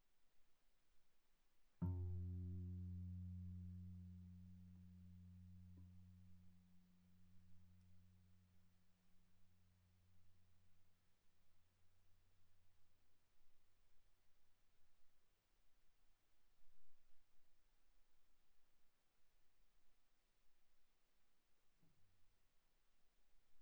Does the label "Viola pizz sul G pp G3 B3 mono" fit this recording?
Yes